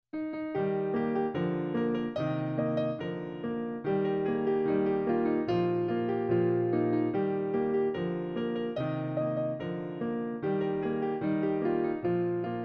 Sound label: Ecossaise in E-flat